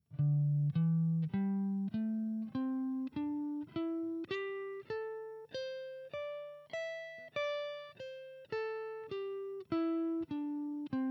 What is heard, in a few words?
Pentatonic Pattern, guitar